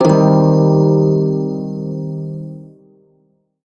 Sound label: Shawzin Blues Chord; Warframe; Prime Shawzin